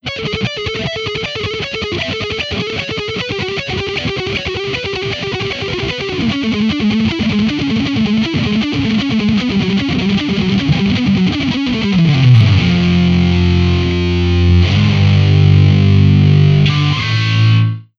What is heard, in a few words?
diezel herbert